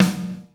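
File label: Ludwig snare, ACOUSTIC KIT; Greytsounds VOL-1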